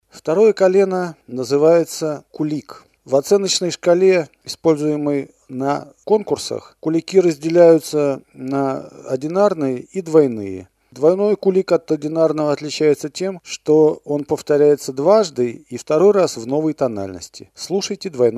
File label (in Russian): kulik